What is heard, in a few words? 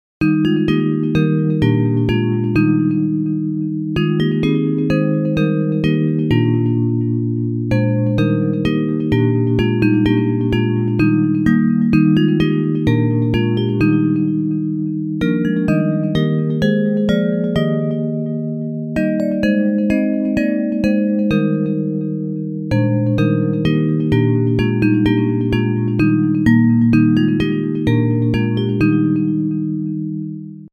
Bells, Hymns